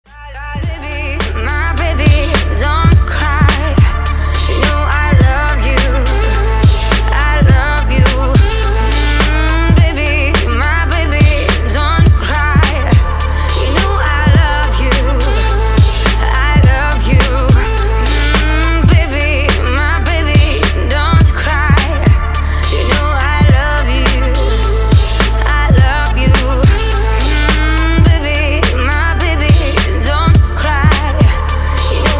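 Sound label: popular song for call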